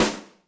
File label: snaredrum, Yamaha RM 50